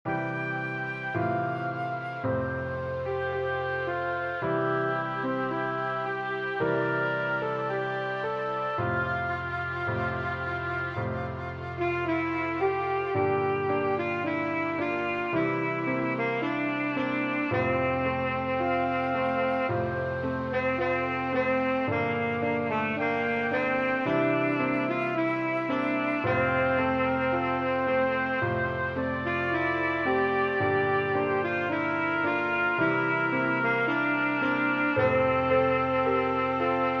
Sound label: Sax D